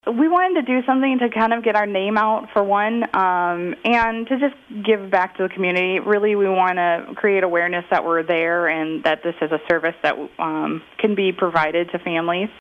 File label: Carnival; Kids Konnection